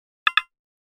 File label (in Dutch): Menu Selectie; Audiodesign